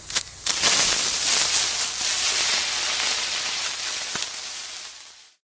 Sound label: creeper fuse